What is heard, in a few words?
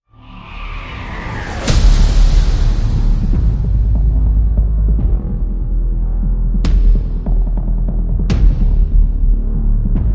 No Brass and No Strings